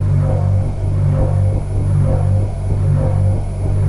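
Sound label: Fan Antique Loop Buzz; fans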